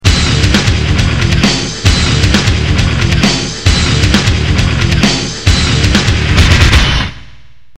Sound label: music; N64 Project